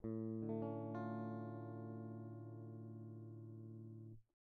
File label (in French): Accord Simple DI